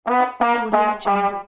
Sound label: game over